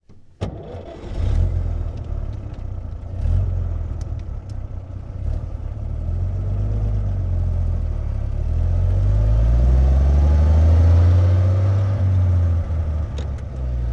1929 Sedan Int Start Slow Shift Off, Period Vehicles